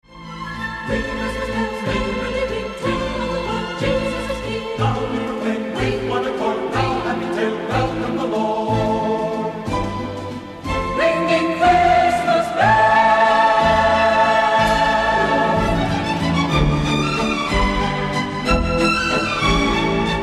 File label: Ring Christmas Bells We Wish